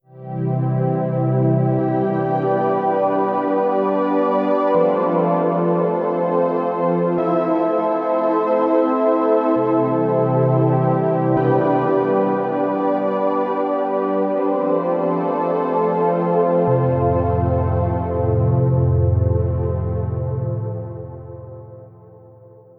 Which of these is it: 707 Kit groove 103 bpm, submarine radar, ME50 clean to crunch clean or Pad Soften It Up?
Pad Soften It Up